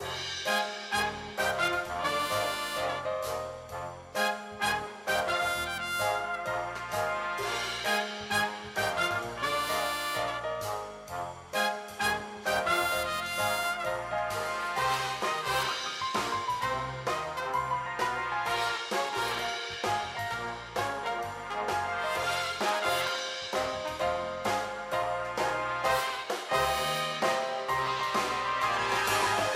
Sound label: BGM Main UI; Premier Night